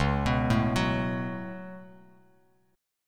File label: C sharp 7th Suspended 4th Sharp 5th, Bass Standard; C#7sus4#5; arpeggiated; JGuitar